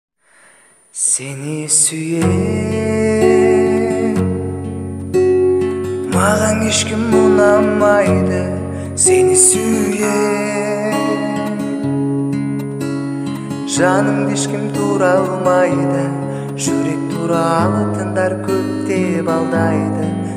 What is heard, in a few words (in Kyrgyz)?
cover gitara